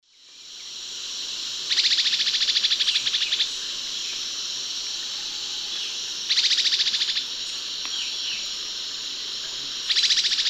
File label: Schistocichla leucostigma, alarm call; S. America; Eastern Lowlands